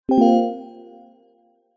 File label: notification digital bell warm negative